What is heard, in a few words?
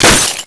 hit glass